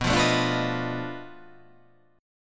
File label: B 7th Sharp 9th Flat 5th, down